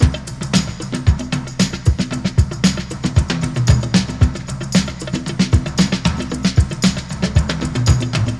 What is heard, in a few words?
Bongo Band